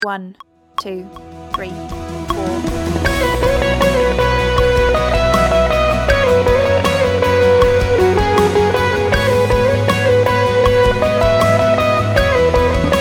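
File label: electric guitar ref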